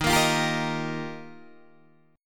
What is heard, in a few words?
D sharp Major Add 9th, Guitar Open D